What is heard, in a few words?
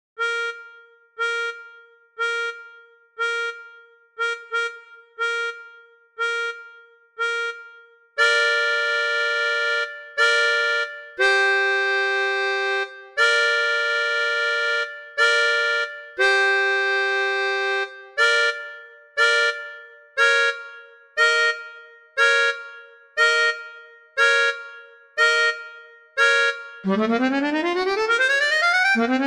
melodica; Gayane act tune